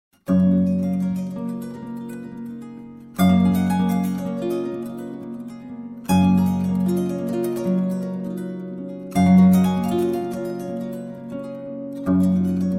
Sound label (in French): kora le griot; afrique; ouest